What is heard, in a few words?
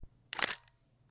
Nikon f 70 shutter